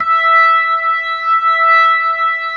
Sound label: E5, B3